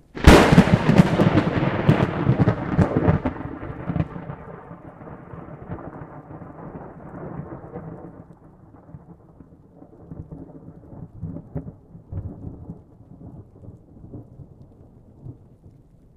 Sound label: Piezo crystal far; Barotraumatic